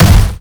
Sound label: Mailbox; Nicktoons Nitro; arcade